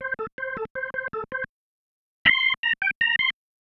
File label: Single Riff, Organ